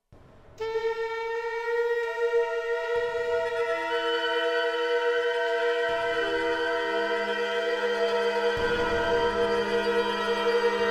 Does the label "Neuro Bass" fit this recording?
No